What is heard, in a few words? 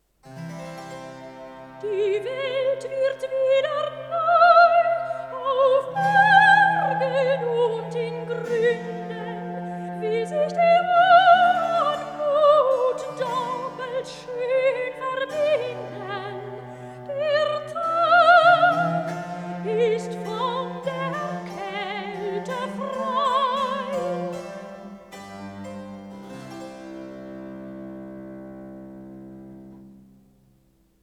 Wedding Cantata - Recitativo; Die Welt wird wieder neu; Baroque; J.S. Bach; Secular Cantatas; BWV 202